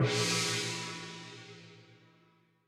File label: Gong; Boomerang Fu